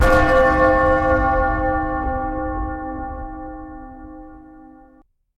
Medieval Bell

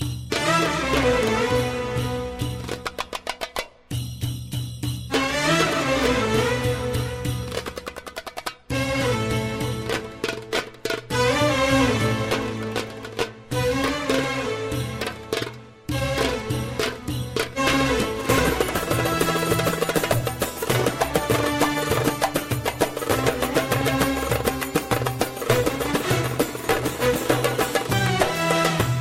Balkan Sound

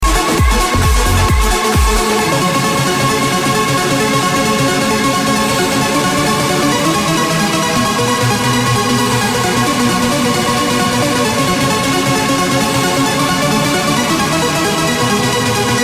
Dance Beat

